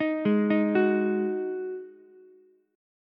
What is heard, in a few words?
D sharp Minor Double Flat 5th, Ukulele; arpeggiated; chord